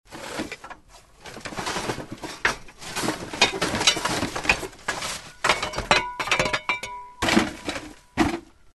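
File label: sounds of shovel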